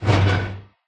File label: manhole metal slide door open close; SFX; doors